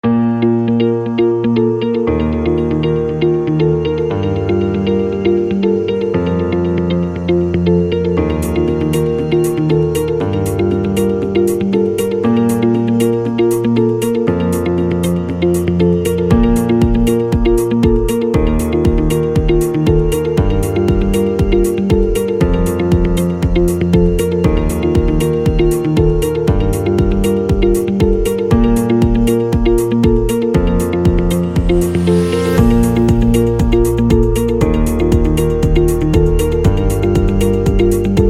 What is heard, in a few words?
Minimalism, corporate music; corporate music vol 4